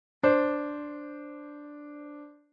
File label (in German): kl Septime D; Unreine Verminderte Uebermaessige Intervalle